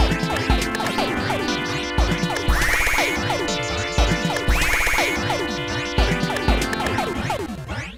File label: Electro